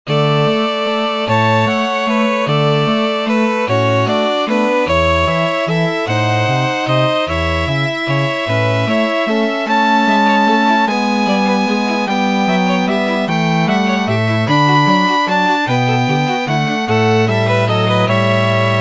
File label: quartet